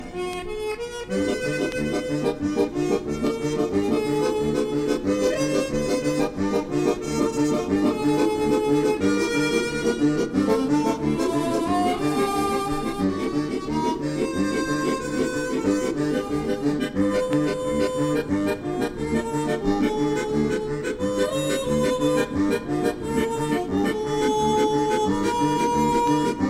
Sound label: amazing melody, ringtones